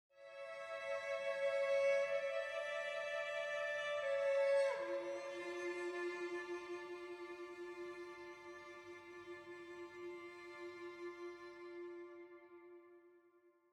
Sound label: Explore Day Stringlines; Horizon Zero Dawn